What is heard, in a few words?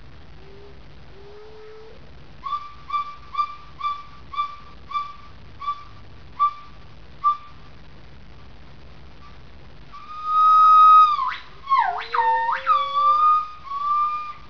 Male, gibbons